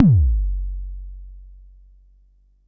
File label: Bassdrum, Jomox Xbase09